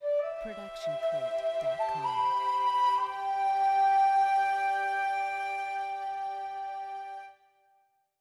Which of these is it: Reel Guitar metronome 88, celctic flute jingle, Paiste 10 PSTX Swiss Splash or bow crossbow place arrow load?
celctic flute jingle